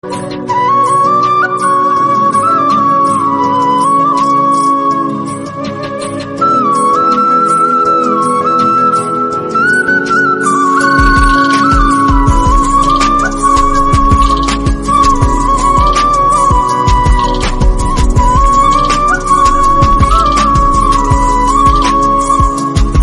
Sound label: Flute BGM